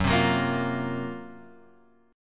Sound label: F sharp Major 7th Flat 5th, Guitar, Open F; strummed; JGuitar